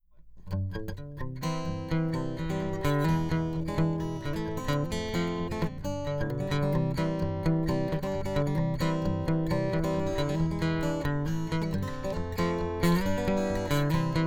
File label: BG Piezo Eq; ブルーグラス; ここではいわゆる"ブルーグラス"風のフレーズを弾いていただきました。; ピエゾ; ピエゾEQ; Santastic